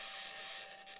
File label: mic, mars2020 edlcam